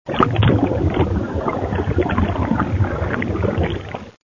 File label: sploosh